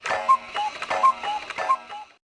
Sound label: radio tap; kbbl